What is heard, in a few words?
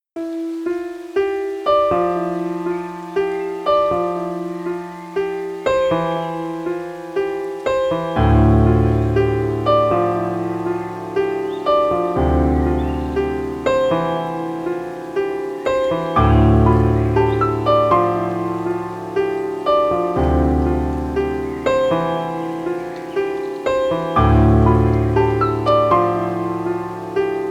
cinematic piano chilling